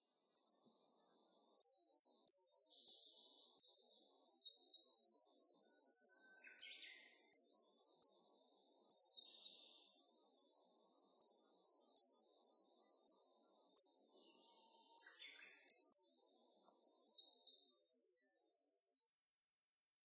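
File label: Asian Brown Flycatcher; koe; nasushiobara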